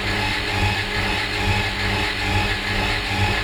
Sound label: Aircrafts Engines DB605 Starter Spin; DCS